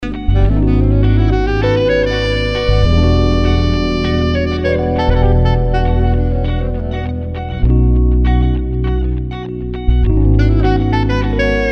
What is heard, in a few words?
Smooth Sax Riffs